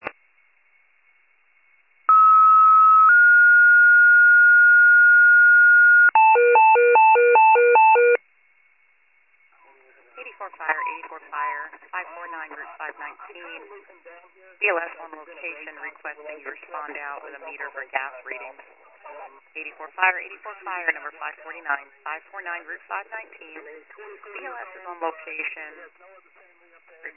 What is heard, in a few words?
fire, pager alert